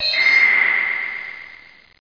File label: indust; 12INDUST; SimCity2000™ Interactive Demo